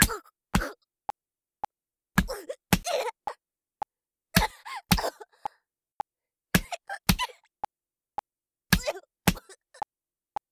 ストレートメト BPM110; 威力高め; ワンツー

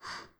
ブレスミディアム

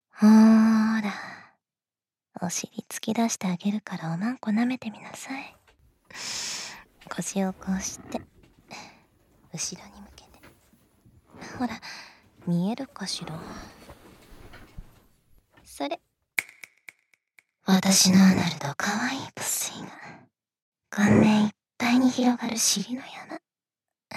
左右同時耳舐め淫語エッチ

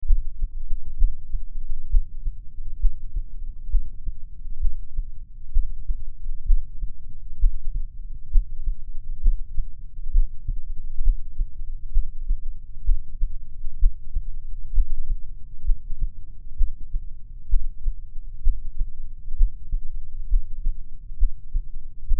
心音のみ; 妹たちに癒して貰った件

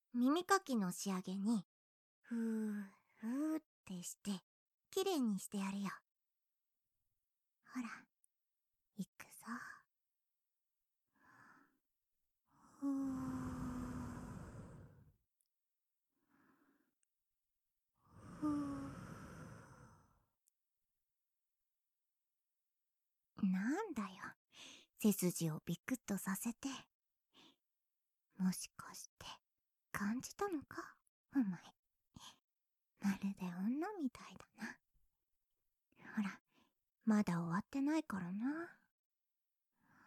はむはむ＆耳舐め, ASMR; ツンデレなゲーマー彼女

